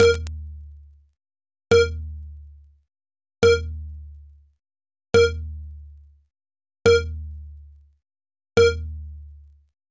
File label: EAS Alarm Mock; Somalia